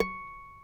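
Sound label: C#5 HARMONIC, CLASSICAL GUITAR; Northstar - New Gold VOL-2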